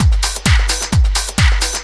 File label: drum 130 groove; Techno Trance Essentials